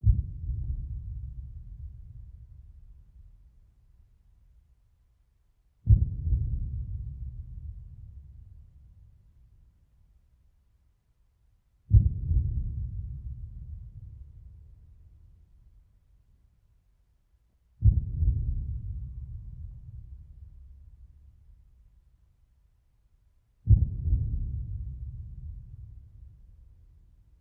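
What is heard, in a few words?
Ambience Machine, industrial ambience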